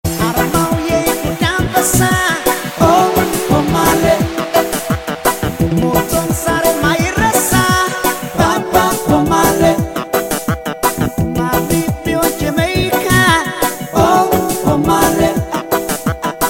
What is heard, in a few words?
Ethio Reggae